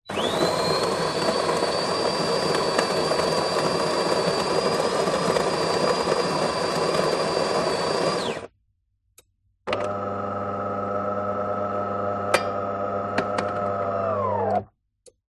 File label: household coffee machine grind beans; delonghi